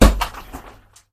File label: Axe Blow On Chainmail; SyrinScape; Iris hill manor